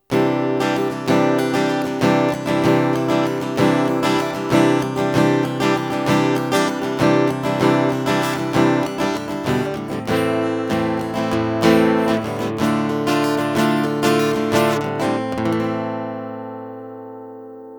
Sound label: Harley Benton D-120 CE Strumming Pickup